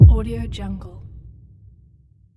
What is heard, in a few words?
low kick